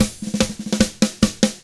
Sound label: drums, 50s rock; ILIO; Double Platinum Drums 1